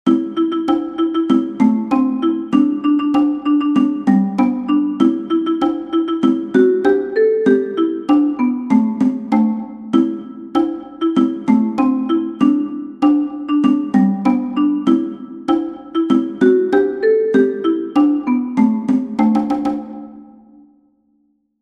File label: Cajon